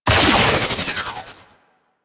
Cargo Train Exit Energy Power Down; Warframe; Corpus Railjack

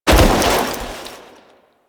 Foley Roll; Warframe; Kahl

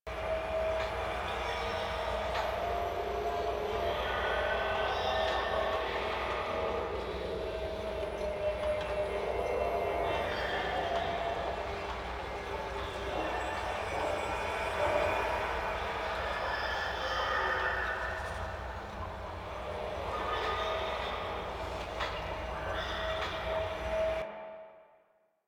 Ambient Loop; Warframe; Venus Open World; Venus Solaris United

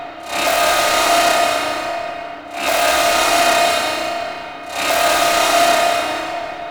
Factory Alarm; Venus; Warframe; Veilbreaker; Kahl

